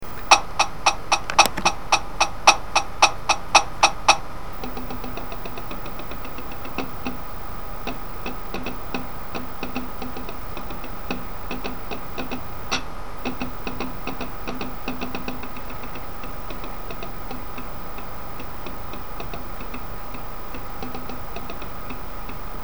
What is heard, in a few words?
bass, drumline